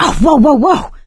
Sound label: ledge hang slip; Left 4 Dead 2; producer